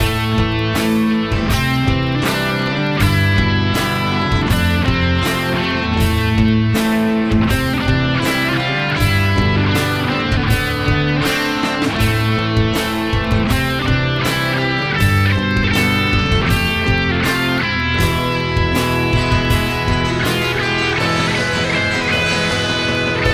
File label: playalong solo